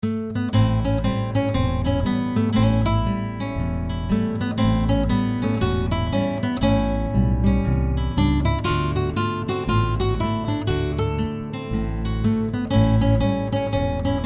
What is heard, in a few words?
the ragtime blues in A